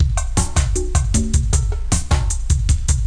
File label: Sound Music